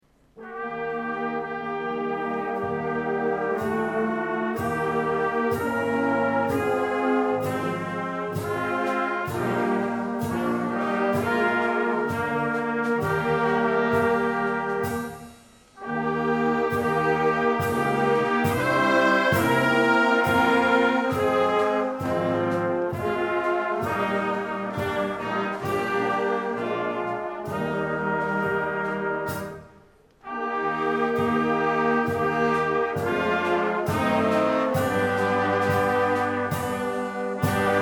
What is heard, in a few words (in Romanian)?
Fanfara; Luni - Ajunul nasterii Domnului Isus